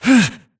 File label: grunt hits; Titanfall 2